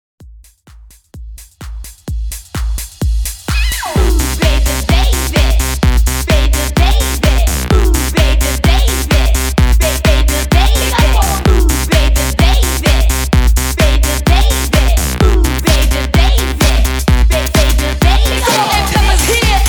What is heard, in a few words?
128 BPM